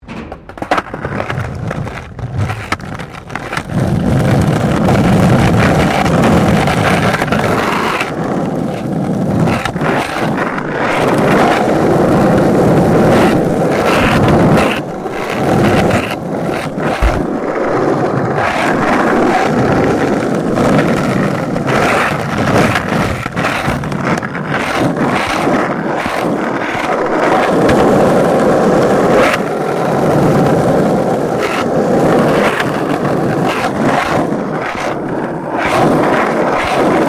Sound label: SKATEBOARD UPSIDE ASPHALT MEDIUM; Skateboard sounds; Skateboarding (Sound Effects:Sports); SoundEffects-Foundation4